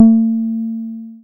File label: BAS SH-101; Best Service Gigapack 2